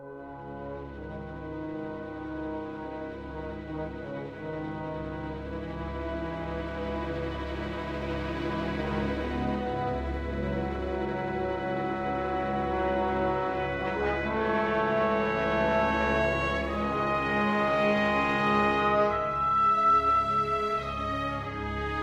uk defeat, music

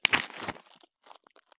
turn gravel; Escape from Tarkov

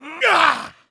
vce spin

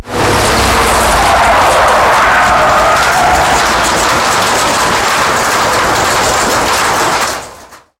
turn wheel, tanks; War Thunder

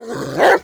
attack; Rust

